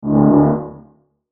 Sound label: Tonal Swell Heavy; Trailer; Blastwave